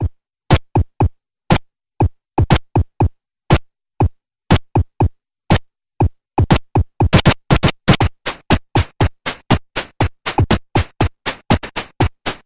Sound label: midibox sid; mbsid-d